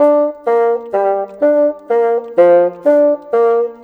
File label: Bassoon, Orchestral, Rock Pop